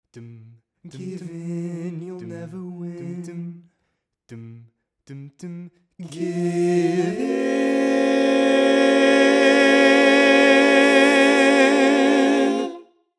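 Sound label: Baritone, barbershop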